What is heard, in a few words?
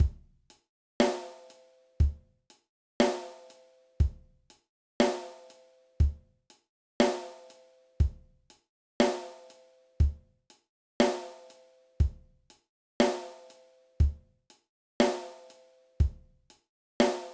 Rim Shots Exercise; learning drums